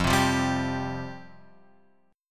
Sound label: F Suspended 2nd, chord; Fsus2 Chord {1 3 3 0 X 3} strummed